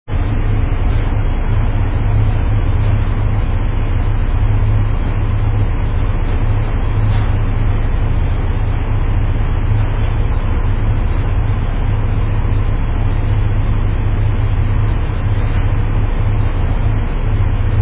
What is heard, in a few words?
steamboat